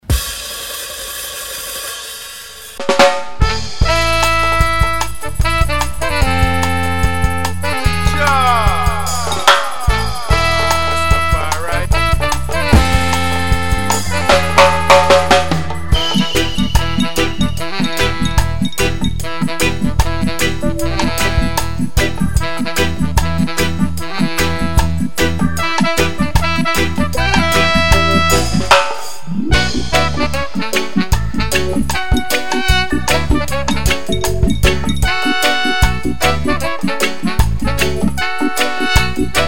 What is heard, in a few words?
instrumental